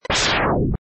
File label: Chargeshot; Mega Man